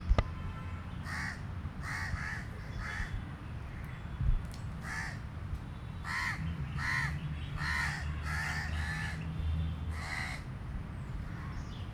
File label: birds crows quiet, South India street recordings